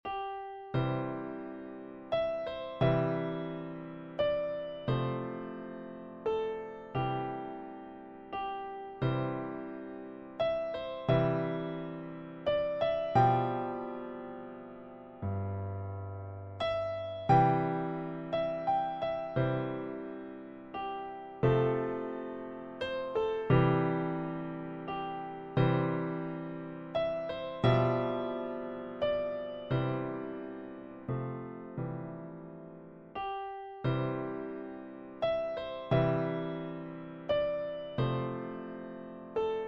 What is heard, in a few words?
Piano Midi; Amazing Grace; Level 2